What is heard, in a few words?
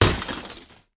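riot bang, panic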